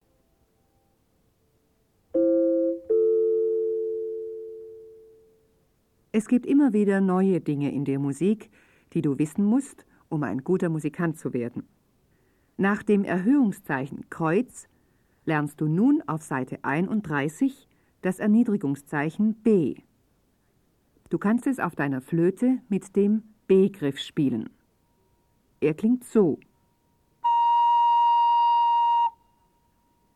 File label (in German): Spiel Blockfloete